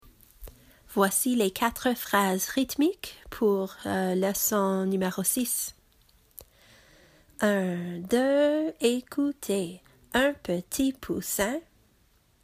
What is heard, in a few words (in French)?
rythmes